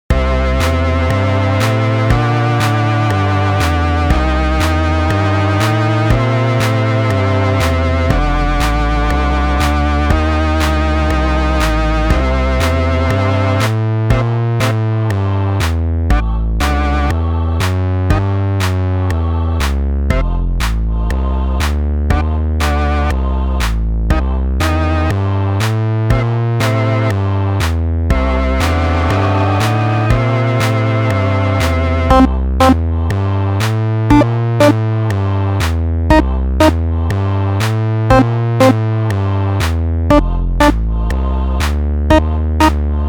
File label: MIDI blues